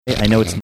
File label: snorting